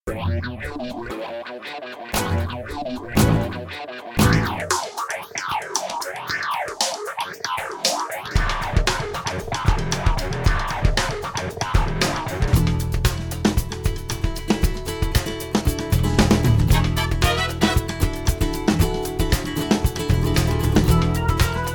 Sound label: Latin Wah Wah Funk; JoosTVD; For Your Pleasure Vol1